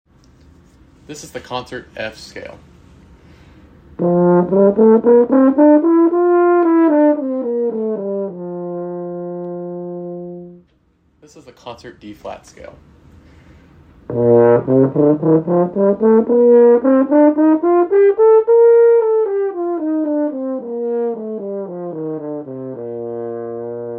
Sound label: scales, band; madison bison band